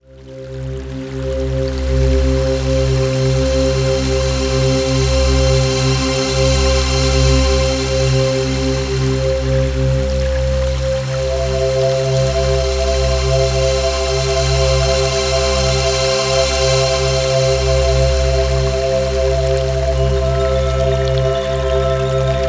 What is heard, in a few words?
528 Hz Music